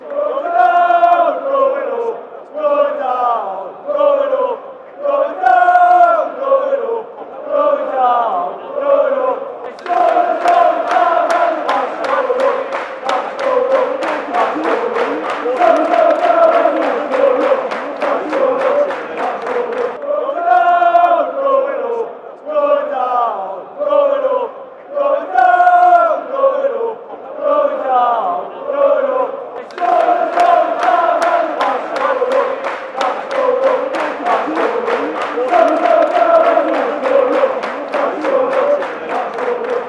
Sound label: fanchants; boros going down and the mags are going up